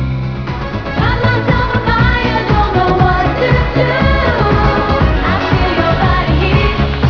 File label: singing; Dazzler; X Men